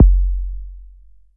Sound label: big bass drum single shot f sharp key